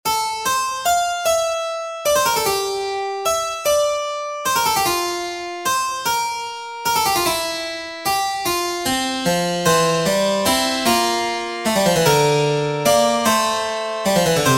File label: Piano